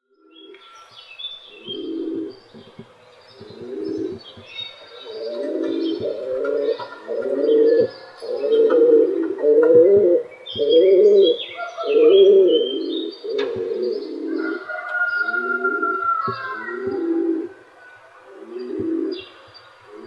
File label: flock of pigeons cooing sound effect